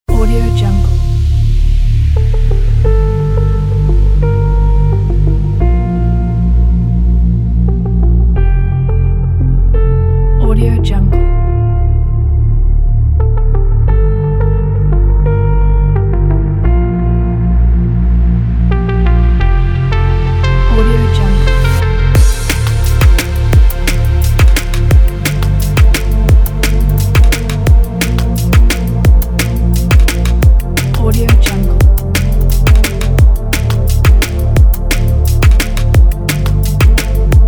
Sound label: action powerful drum and bass pack